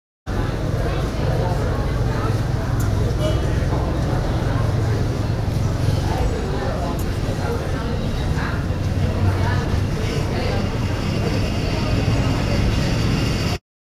Gamelan; Propeller Island - Complete Gamelan

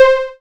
C4, Synth Line; 90 s Sample CDs; NiceBeats; SynthLine VOL-21

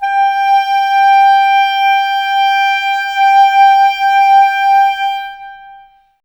clarinets, Wood Winds

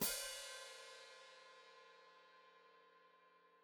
Hat Closed Open, Hi-Hat

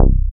BAS, Eurotech; Sounds Good

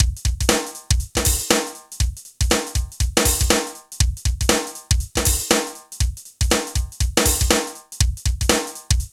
HIPHOP, Funky; INIS; Funky Xtreams

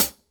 HH CL, Drum 1 Dry; East Collexion

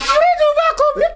FLU + VOC, FLUTE + VOCAL

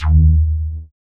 WET BASS; Masterbits; Dance Trax

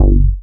BAS G1, 2 Step; USB Soundscan vol.51; House Side Of 2 Step; AKAI

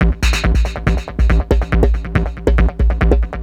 Beats, Industrial

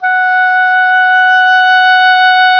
oboe dry; Roland L-CDX-03 Disk 1; Oboe 4-8

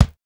Kick, Fairlight CMI III

